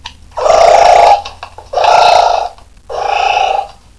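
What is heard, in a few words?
anteater; zoo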